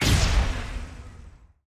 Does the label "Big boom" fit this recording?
Yes